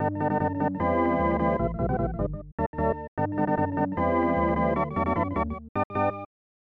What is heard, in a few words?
Fanfare Happiness; super bases loaded 3; snes